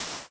sand; Scratch; Mine

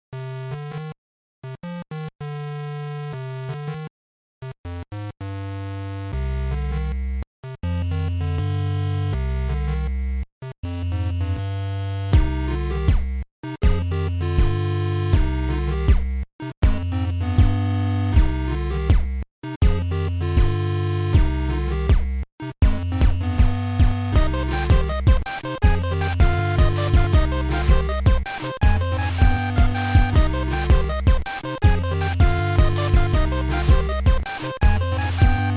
8bit; Scratch; Mine

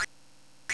pacman power; Scratch

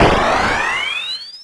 Laser on; Scratch

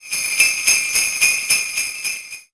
jingle bells; Scratch; Angry Birds

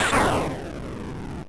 sonic down spin; Scratch

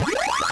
pacman get ghost; Scratch

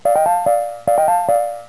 level completed; Scratch; Donkey Kong

